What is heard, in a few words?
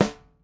Beefy B, Dimensional Snare; Airwindows